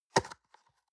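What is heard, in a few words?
box small close medium, Büroarbeit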